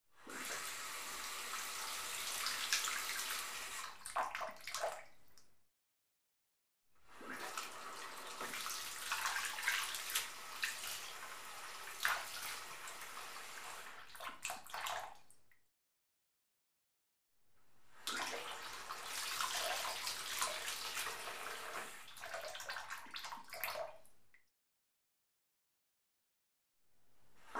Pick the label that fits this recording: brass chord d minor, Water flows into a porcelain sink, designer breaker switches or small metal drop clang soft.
Water flows into a porcelain sink